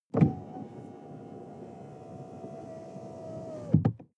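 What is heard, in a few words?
sports car interior window close distant; verkehr transport; autos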